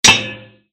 鍵を開ける

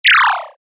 ピョーン 高音 コミカル; 演出効果音

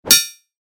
スピア モノ; 武器効果音

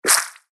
足音 水辺 単音 二歩目 モノラル; 足音の効果音

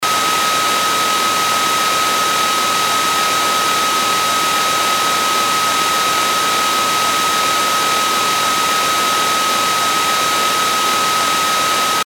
ノイズ ピー音入り 低め

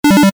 ポーズ レトロ モノラル

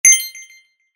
決定; モノラル

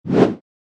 剣を振る; 武器効果音

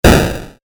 ダメージ レトロ

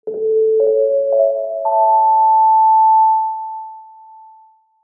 お知らせ 始まり 遅め リバーブ有り, theater se; 開演ブザー; 演劇・舞台

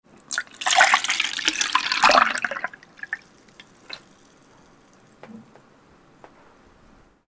そそぐ

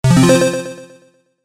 決定 低音; モノラル

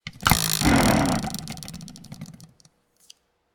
ルーレット; board game casino mahjong

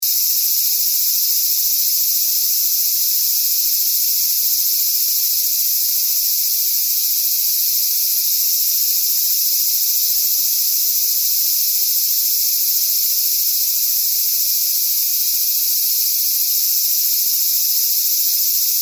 蝉の鳴き声; Nature and Field Sound Effects

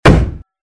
盾 木製; モノラル

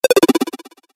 キャンセル 低音 レトロ

